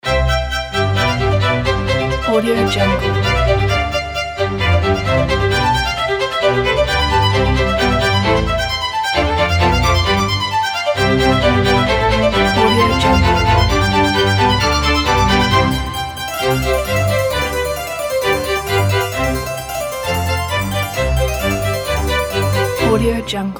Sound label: baroque quartet